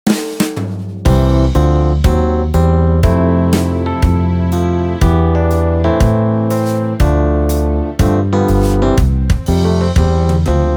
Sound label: Scuba Diving Instrumental